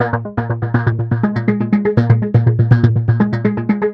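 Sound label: 122 BPM Sequence F major D minor Tonic C; innovative sequences 1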